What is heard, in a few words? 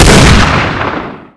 dbarrel, weapons; svencoop